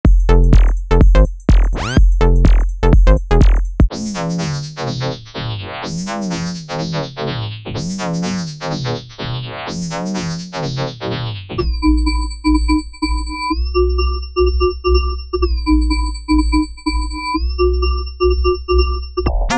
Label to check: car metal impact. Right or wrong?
Wrong